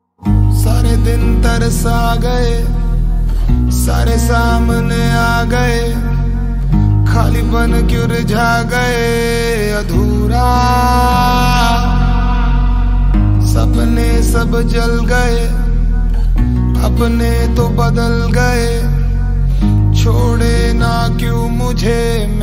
hindi rap ringtone

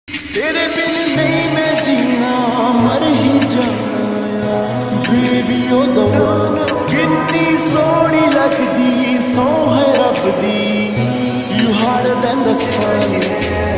lofi punjabi ringtone

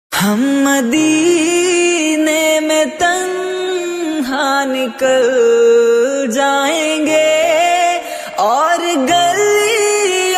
islamic ringtone

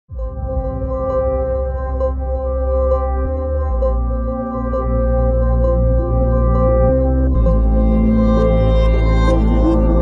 Instrumental BGM ringtone

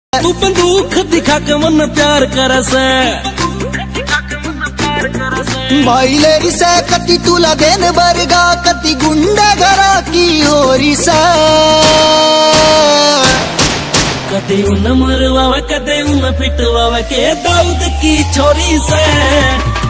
haryanvi ringtone

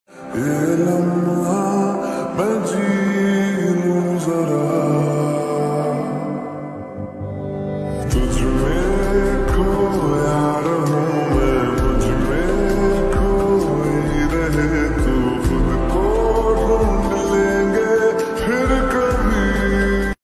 lofi remix hindi ringtone

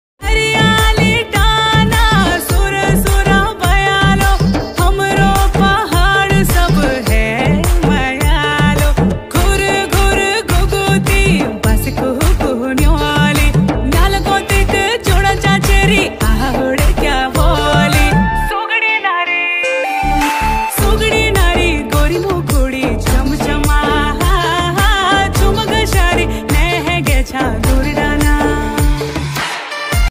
uttrakhandi ringtone

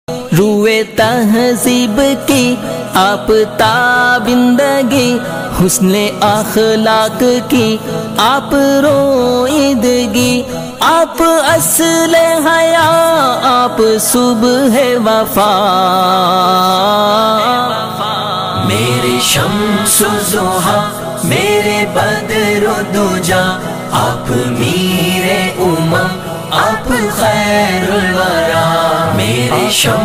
islamic ringtone

